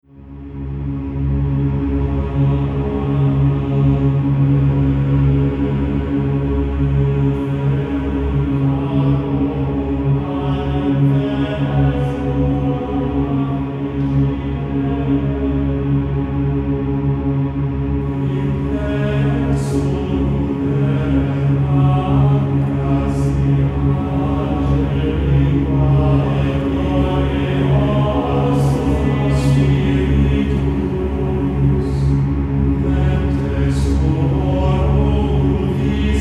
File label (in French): extrait chant liturgique gregorien